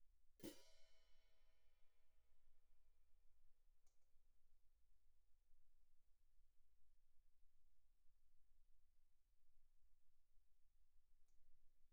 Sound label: hihat, foot splash, pp; Percussion; cymbals